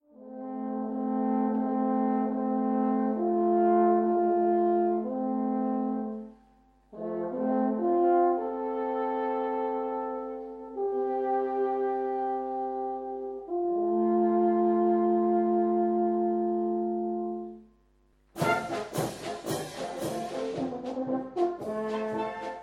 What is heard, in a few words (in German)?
Alphornpolka